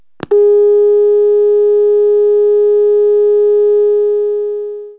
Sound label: Clock Radio Alarm; Household; 1,000 Sounds (16-bit); Clocks